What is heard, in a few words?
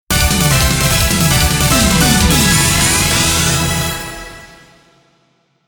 Clear Fanfare!; puyopuyo original soundtrack